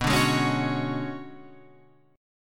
B Minor 6th Add 9th B down Guitar Open F; Bm6add9 strummed; JGuitar

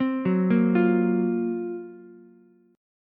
F sharp Major 7th Flat 5th, Ukulele; arpeggiated; JGuitar

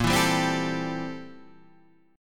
A Minor 7th Bb, Am7/Bb chord; Am7/Bb strummed; JGuitar

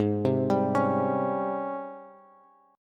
G sharp Major 11th, Bass; arpeggiated; chord

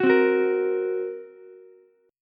F sharp Major Flat 5th F sharp Ukulele; F#Mb5 strummed

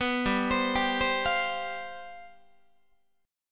G 11th, Guitar; arpeggiated; 14,15,15,17,16,16; 4A,4E,5A,5D,5Gsharp,6Csharp

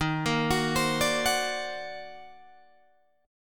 Eb Minor Major 13th, Guitar, DADGAD

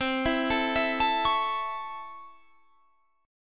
C sharp Minor Major 7th Sharp 5th, chord

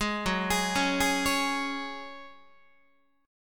F sharp Suspended 2nd, Guitar; arpeggiated; JGuitar

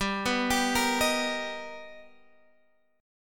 B Augmented Major 7th G, chord

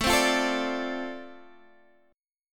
D-Minor 7th A, chord

